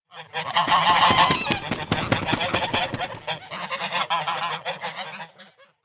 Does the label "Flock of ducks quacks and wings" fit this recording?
Yes